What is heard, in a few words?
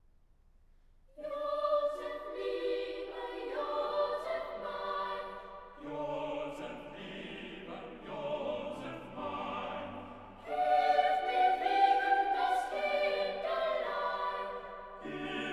Cathedral Choir, Magnificat